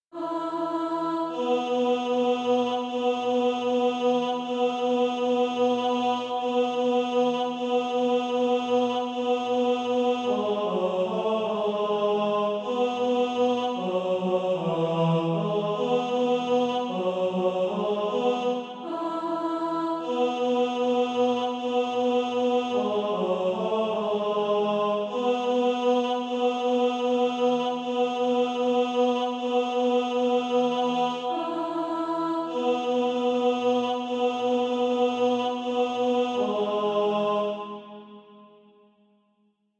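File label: tenor, Practise Music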